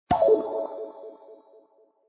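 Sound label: spells disappear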